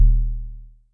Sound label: Sub, Electric Kick, Yamaha DTXpress